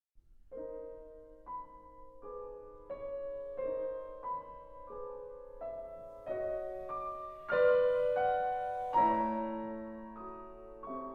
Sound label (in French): Musique pour vents, Lied; Hetu